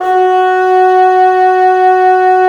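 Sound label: WND BN, wind bassoon; LA Composer vol. 3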